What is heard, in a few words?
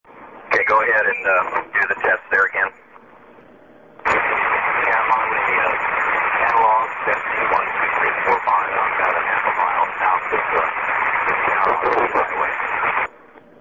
Analog vs MotoTRBO